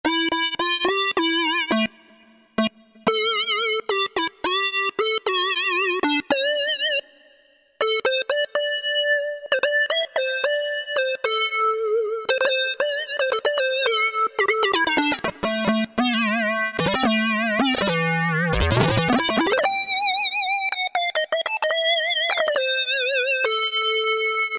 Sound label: Mini LEAD